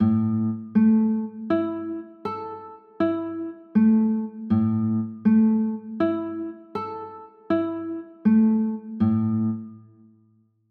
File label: a 6, chords